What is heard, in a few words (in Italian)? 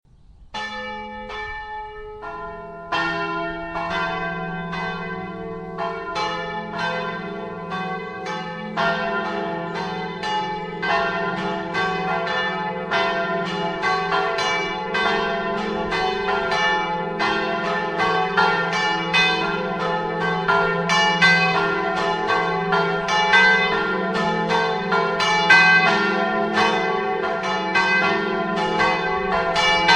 campane; GORGO